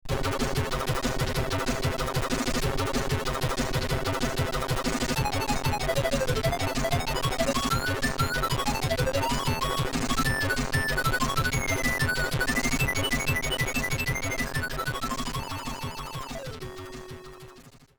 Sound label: Bill Bass; sid